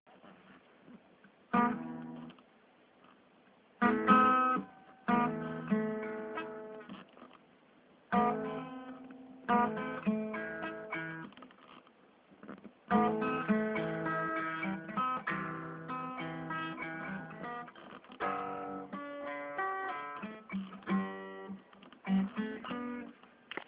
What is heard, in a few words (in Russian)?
запись, Играем на гитаре